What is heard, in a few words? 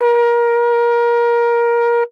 human, blow